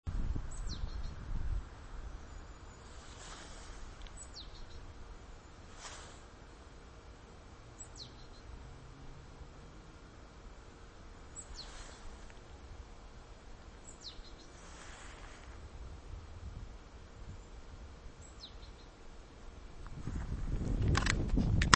Parus major; SETE

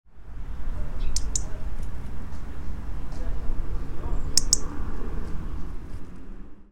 Erithacus rubecula; SETE

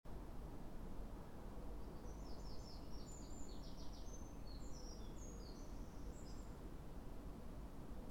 Troglodytes troglodytes; SETE

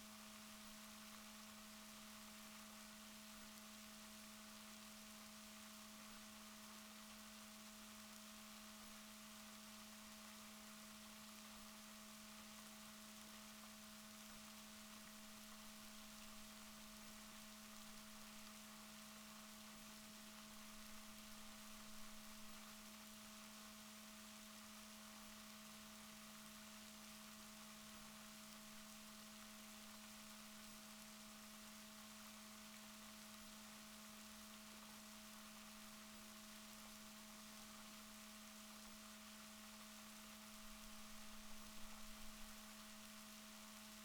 rorquals positif, Balaenoptera Physalus Chile

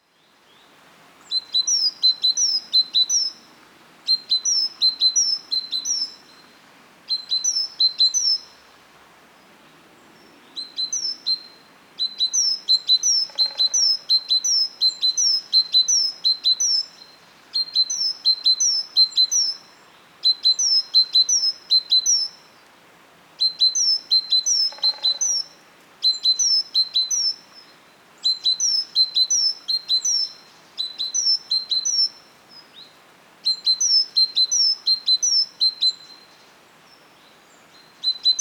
Parus major; SETE

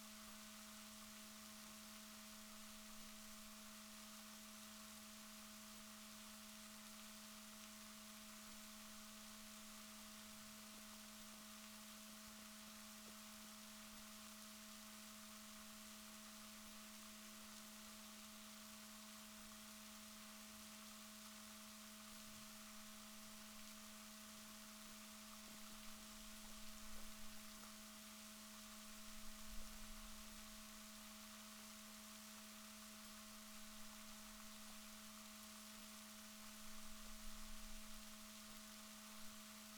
rorquals positif, Balaenoptera Physalus Chile

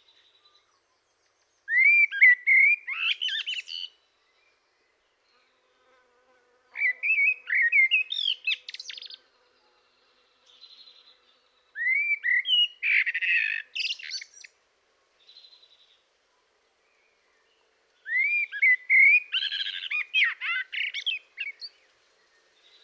Turdus merula; SETE

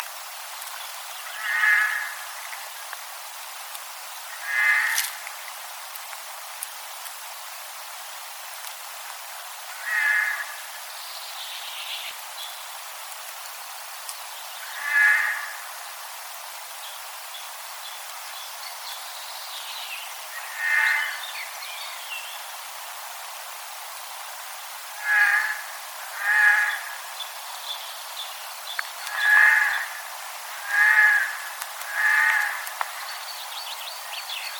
Garrulus glandarius; SETE

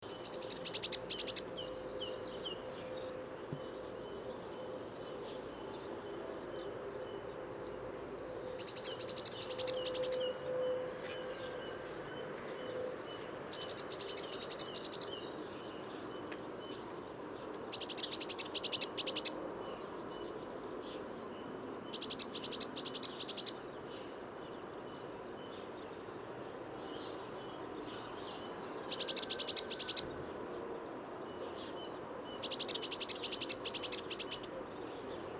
Cyanistes caeruleus; SETE

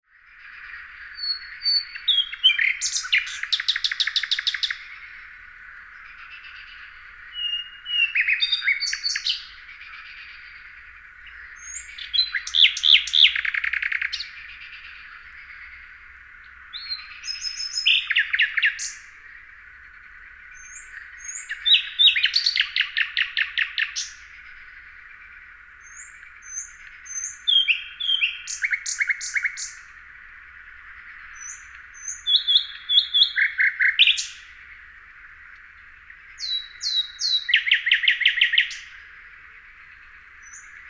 Luscinia megarhynchos; SETE

